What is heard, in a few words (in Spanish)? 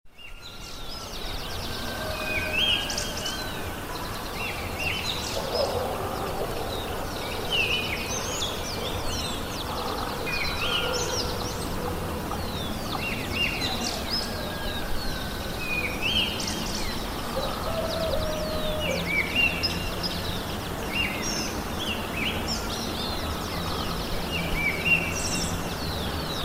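Pajaritos En La Mañana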